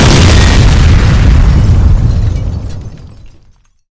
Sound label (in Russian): katastroficheskij spontannij vzriv snaryada